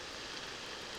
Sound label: Background Noise